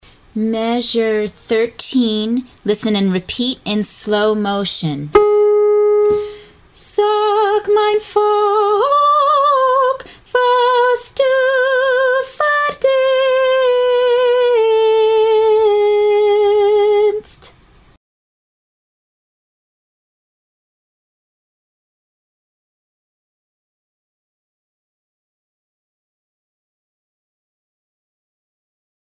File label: Un Du Akerst Alto Slowly